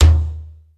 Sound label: verby tom sound d sharp key; tom drum